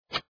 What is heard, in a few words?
hover